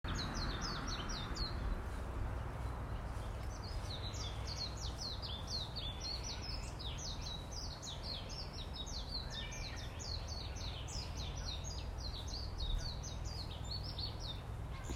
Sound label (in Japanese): メジロ